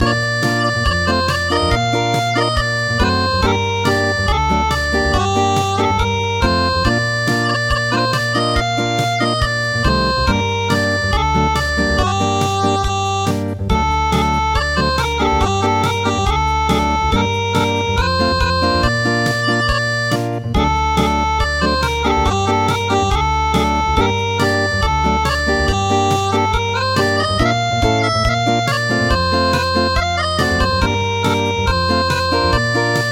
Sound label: Acoustic; Ukraine has not yet perished